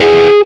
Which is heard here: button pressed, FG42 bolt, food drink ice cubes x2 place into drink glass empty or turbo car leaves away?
button pressed